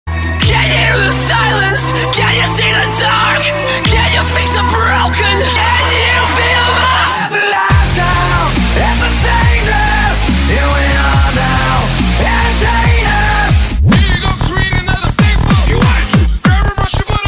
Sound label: rock